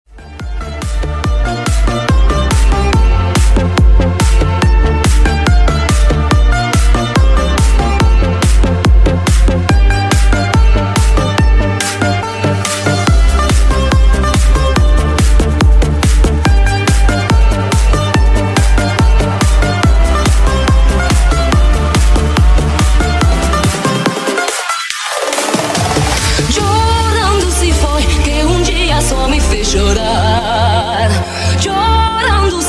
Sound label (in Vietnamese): remix không lời